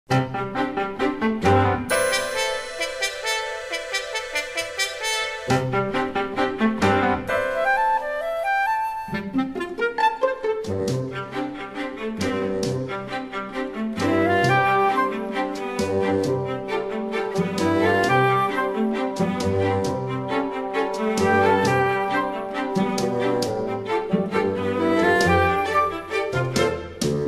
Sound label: INSTRUMENTAL